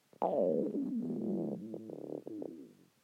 Stomach Growl

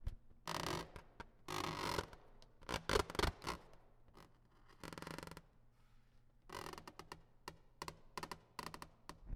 Balloon Screech

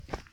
Walking

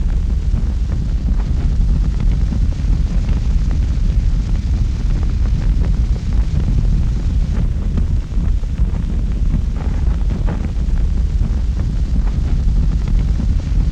Fire Burning With Rumble Without Crackle

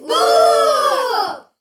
Funny Kids Booo

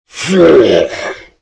Monster Roar

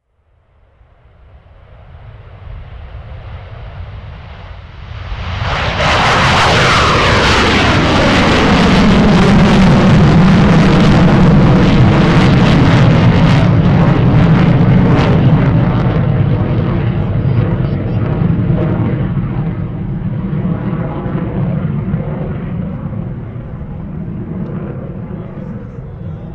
Sabre Jet Flyby

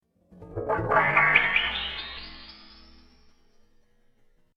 Aluminum Sign Warp Slow

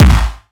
Gloss Stomp Messy

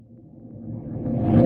Remove the plank

